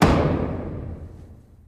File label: Gavel Beefy Slow; gavels